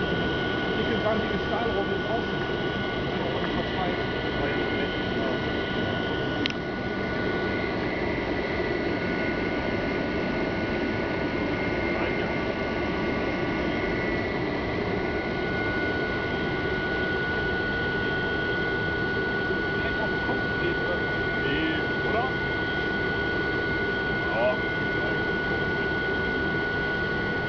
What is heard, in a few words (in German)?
verpuffung, hochofen